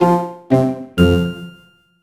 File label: Bedroom Mini Game Fail; Christmas Carol; NDS